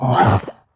Fishmen Injure; We Are the Dwarves

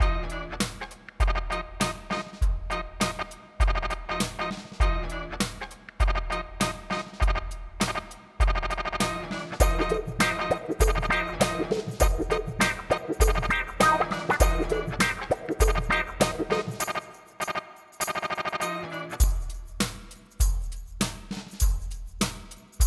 Music Rap Intro; There Is No Game: Wrong Dimension

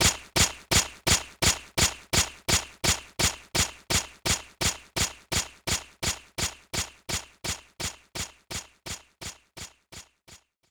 swords

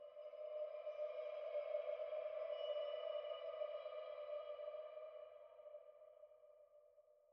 Transition Ambiance Out; song of iron

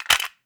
Cassette Rattle

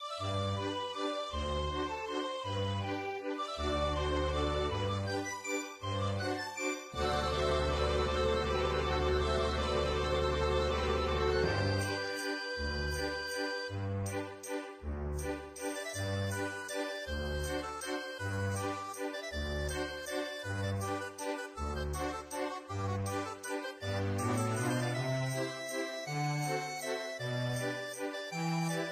waltz; Drago Noka; PS4

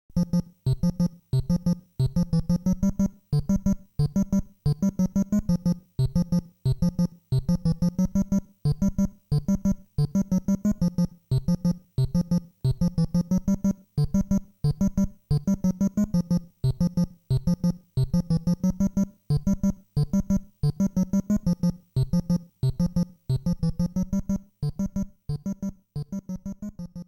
Level BGM; Game Sound Museum Famicom; Donkey Kong Jr

